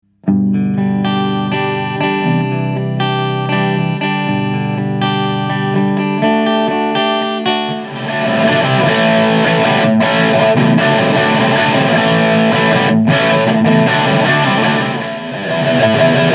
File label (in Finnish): vahvistin teaseri